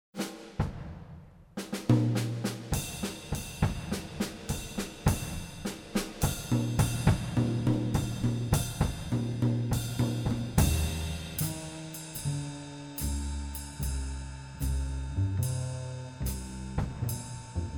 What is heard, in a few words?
Bass Suite; Mvmt3